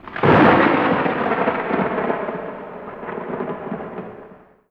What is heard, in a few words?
A#3 THUND; RED VOLTAGE